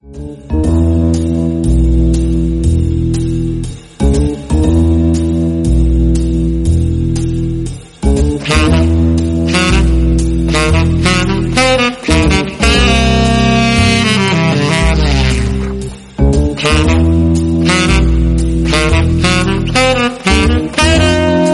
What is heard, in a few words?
theme